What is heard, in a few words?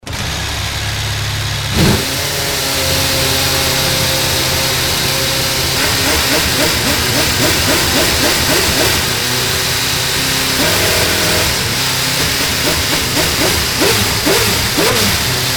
MP4-27 Fireup, moteur en feu; F1; Vodafone McLaren Mercedes